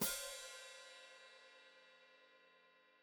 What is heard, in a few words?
Hat Closed Open, Hi-Hat